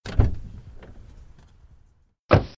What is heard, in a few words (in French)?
portiere; au secours du professeur